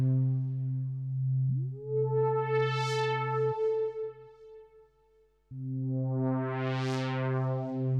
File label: Trance